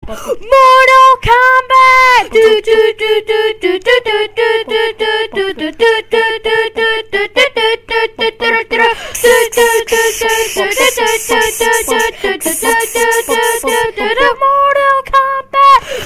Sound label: Kid Sings; Mortal Combat